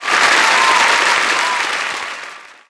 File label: Crowd Applaud